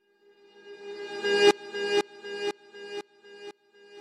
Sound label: Guitar Lick Fade In